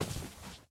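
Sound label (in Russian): приглушённый короткий звук выстрела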